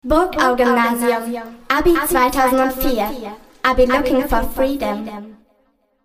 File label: Vocals